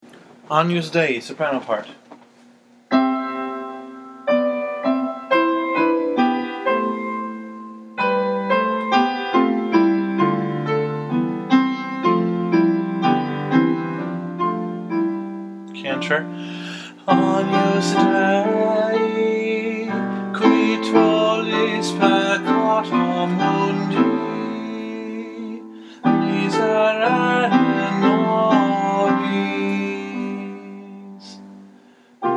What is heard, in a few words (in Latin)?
agnus dei mass xviii soprano